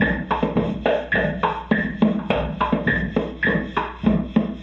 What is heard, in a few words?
Loop, rhythmate, classic machines